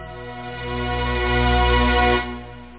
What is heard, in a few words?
tune; ExChaos